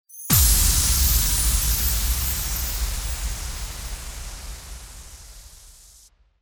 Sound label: CHRISTMAS IMPACT